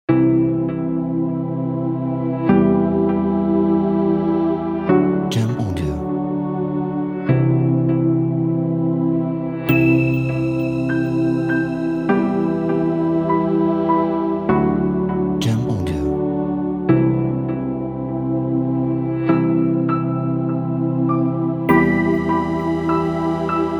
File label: Ambient Urban Promo